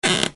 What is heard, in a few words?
creak